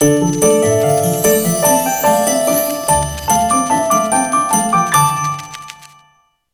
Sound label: Game Win; lernerfolg vorschule prinzessin lillifee; nds